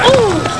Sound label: fall; quake2